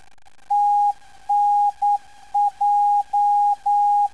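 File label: TNJ 316kHz, non-directional beacon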